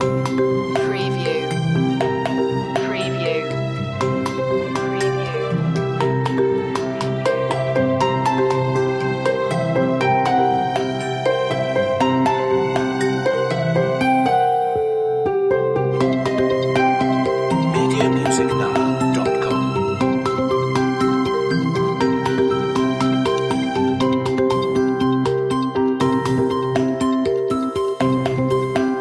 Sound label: jumping jacks loop